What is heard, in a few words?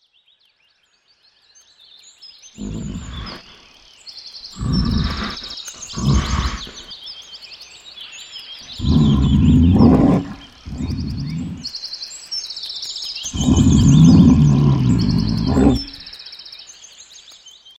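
sounds of bear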